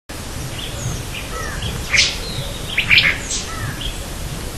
Elaenia mesoleuca SONG; Rio dos Touros; Jorge La Grotteria

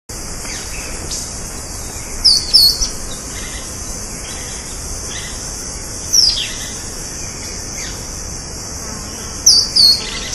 Myiopagis viridicata; Reserva Surucua

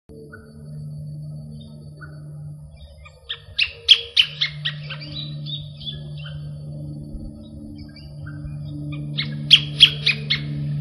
Phacellodomus ruber; Baradero

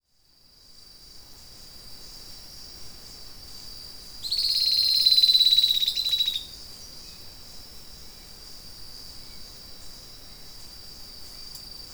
Sclerurus scansor

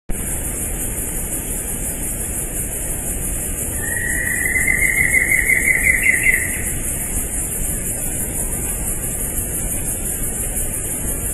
Batara cinerea; RP EcoPortal de Piedra; Jorge La Grotteria

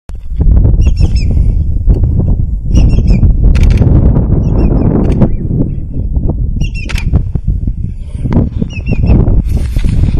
Tringa melanoleuca; Ceibas

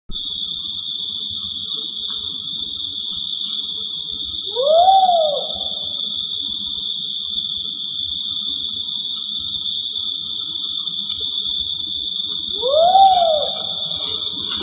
Strix virgata; Reserva Surucua; Jorge La Grotteria

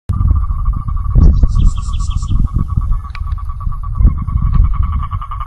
Cistothorus platensis; Tilcara

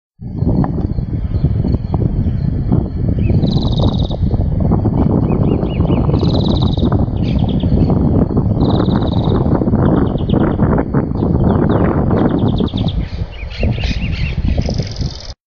Carduelis chloris; Nueva Atlantis

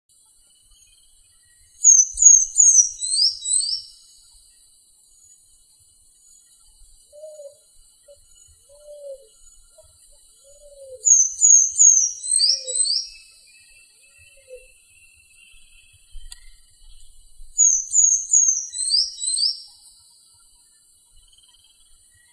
Pyrrhocoma ruficeps; PP Cruce Caballero; Jorge La Grotteria

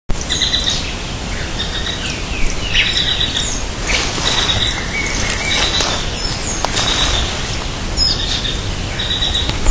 Scytalopus pachecoi CALL; Rio dos Touros; Jorge La Grotteria

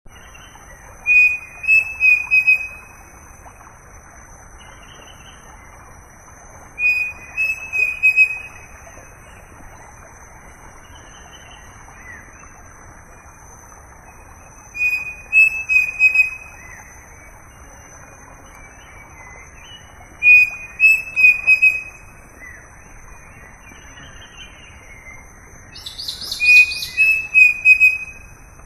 Dromococcyx pavoninus; Ruta 101; Jorge La Grotteria

